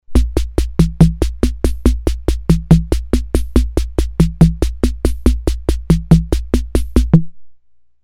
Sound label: MIXED, Ace Tone Rhythm Ace FR-2L